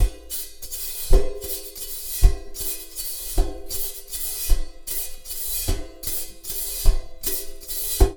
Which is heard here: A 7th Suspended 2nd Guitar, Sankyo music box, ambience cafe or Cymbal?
Cymbal